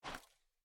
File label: step; snakeinabox; double time pitch shift